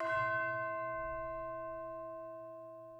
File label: tubular bells C5; M8; BBC